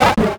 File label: Multimedia Internet CD-Rom Flash Animation